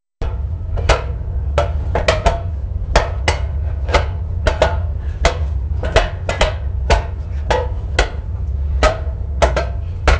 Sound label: Chopping patatos on a metal table